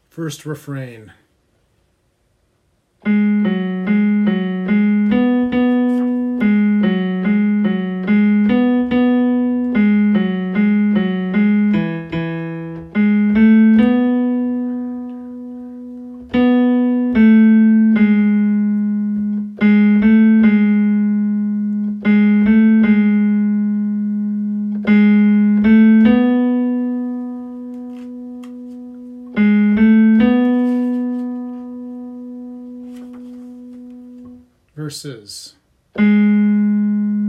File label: bass piano